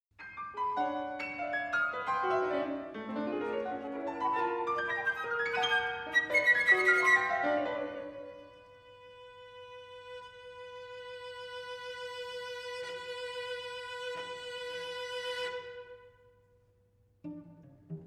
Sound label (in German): Klaenge Farben